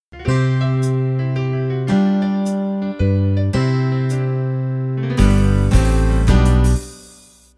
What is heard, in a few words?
Country 3-4 Intro